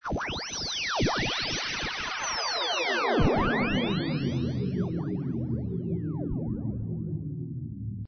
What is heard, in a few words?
Alien Vanishing; RoboTutor